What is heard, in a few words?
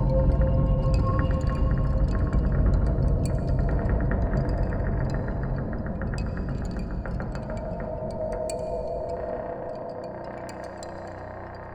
Weapons Menu Ambient; Shadow Warrior 2 gamerip 2016